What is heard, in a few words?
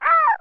cookie damage